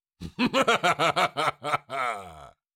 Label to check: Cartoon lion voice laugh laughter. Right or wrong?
Right